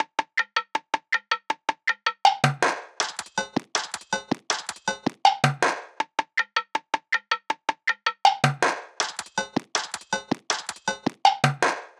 Drum Loop 160 BPM Percussion, Future Bass; Loop Stems; Cymatics; GHOST (Beta Pack); Acorn

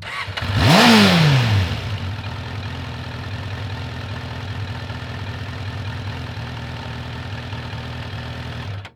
Speed Bike Start Rev Off, Motorcycles; Vehicles

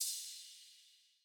Drum Loop - 136 BPM Crash; Loop Stems; Cymatics; Vibes II Drum Loop Collection; Zenith

